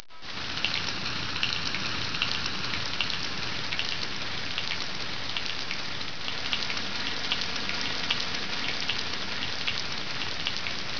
RAIN

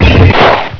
kerchunk

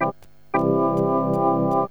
MAJ, ORGAN, KEYBOARD; cubase; CUBASIS

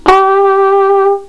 FLUGEL

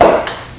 cork; CLICKS

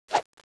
slice

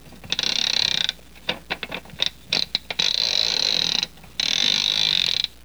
CLICKTY

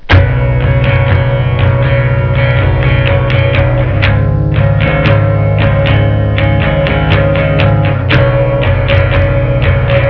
STRUM

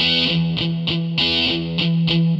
GUITAR, ROCK POP; MAGIX

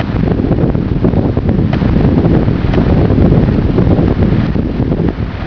WIND

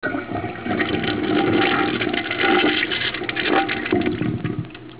FLUSH, BATHROOM; EFFECTS

